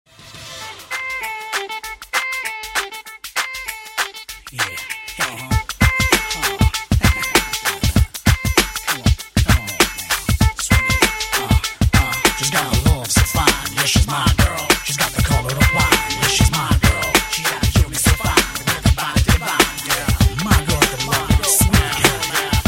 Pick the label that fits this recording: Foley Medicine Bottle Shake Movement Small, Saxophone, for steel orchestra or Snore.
Saxophone